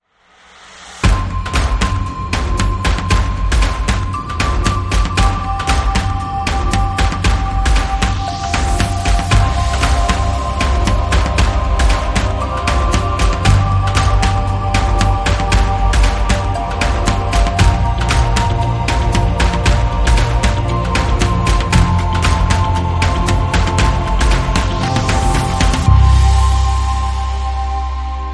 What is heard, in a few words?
Chasing Light, No Piano